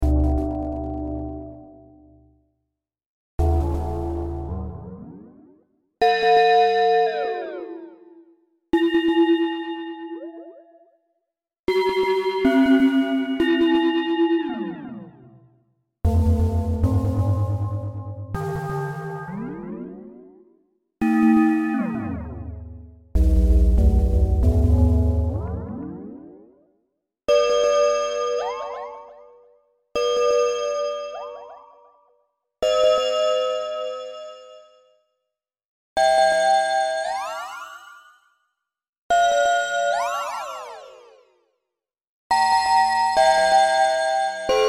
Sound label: Xivah Synth